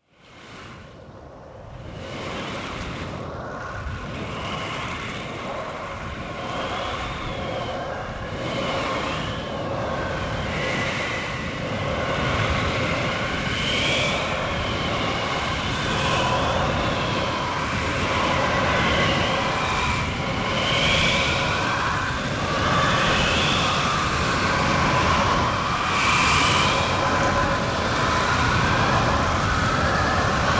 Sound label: A tornado; Spot effect; Wind; Part of Wind (Sound Effects:Weather); BBC